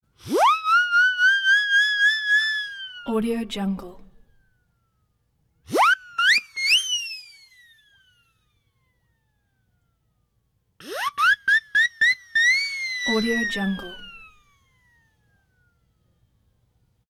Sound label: hurricane whistle comic or toy revs whirligig